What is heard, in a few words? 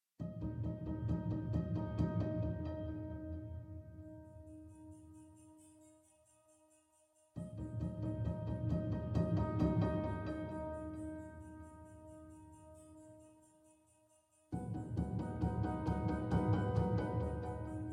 K.I.A Plucks; Sniper Ghost Warrior 3 2017